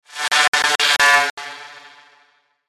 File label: Stutter Swell Whistle